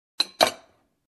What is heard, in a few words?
Placing porcelain mug in shelf